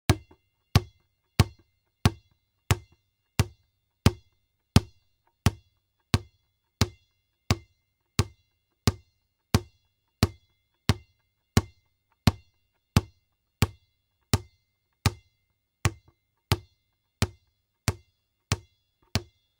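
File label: bouncing a ball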